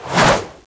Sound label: knife miss